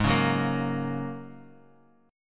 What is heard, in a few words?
Ab 7th Suspended 2nd Suspended 4th down Bass Standard; Ab7sus2sus4; strummed; chordshape; JGuitar